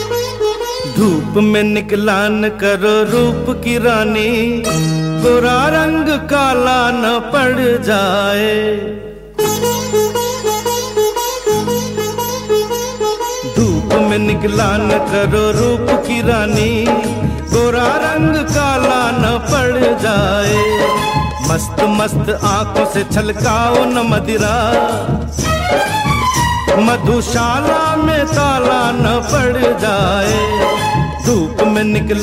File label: Old Hindi Song Ringtone